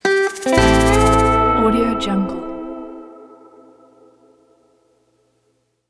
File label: dusty roads western logo